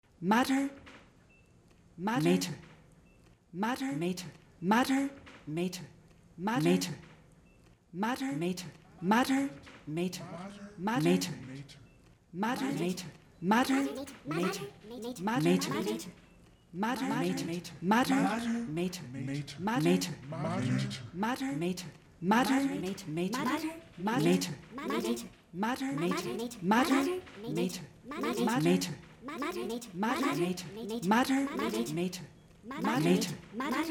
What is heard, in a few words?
prelude